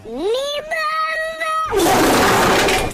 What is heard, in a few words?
fart